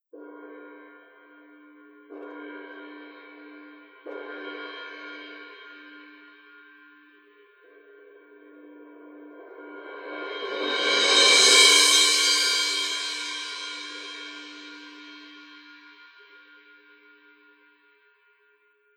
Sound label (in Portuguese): prato suspenso; Conhecendo Instrumentos Musicais